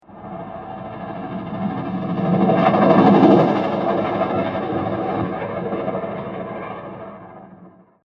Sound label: Horror Ghost train Transition; Scary Soundscapes; Sound Effects:Horror; CraftedSignals